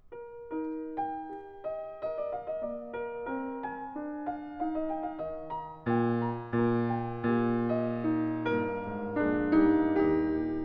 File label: Variations and fugue for piano in E flat major